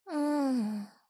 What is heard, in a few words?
Mita Hmm; Game rip; MiSide